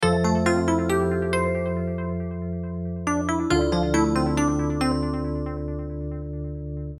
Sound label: Short mistery